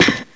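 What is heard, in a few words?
gr step, footstep metal grate; SINDEMO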